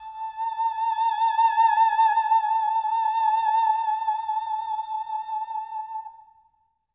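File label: soprano "oh" A5; Spectrasonics; Symphony of Voices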